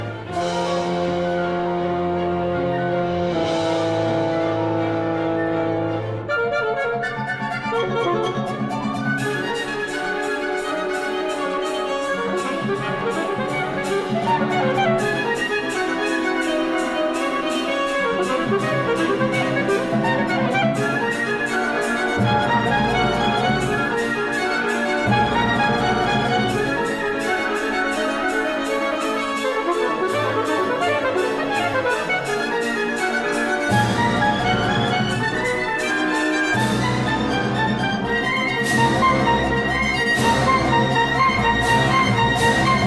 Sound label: fantasia for alto saxophone and orchestra